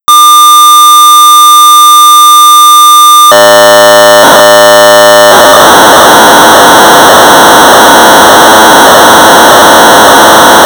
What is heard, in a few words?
Sorcerer 1200 baud; SightSpell; Memory-attention and vision test; BASIC